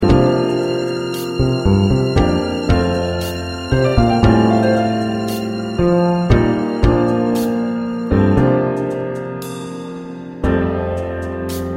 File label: Accompaniment, choir parts